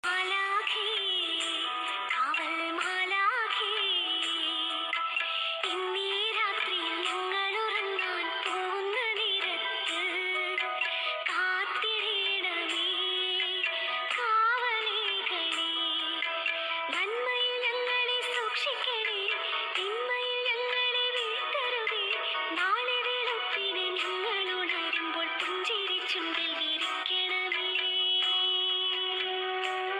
christian devotional song

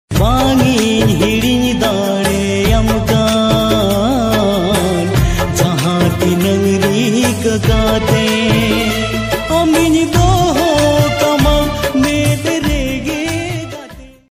santali ringtone

